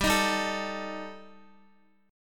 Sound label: G Suspended 2nd Sharp 5th, Guitar; Gsus2#5 strummed; JGuitar